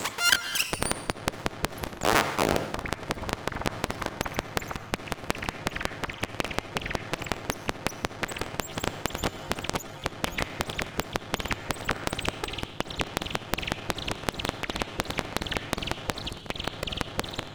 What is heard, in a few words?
ThingaMaKit 82bpm; Daily Noize